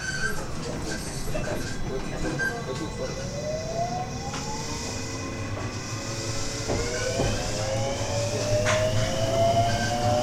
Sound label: HITACHI M1 Hassya; Kinomoto-Yogo